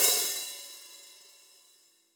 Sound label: Hats Open, drum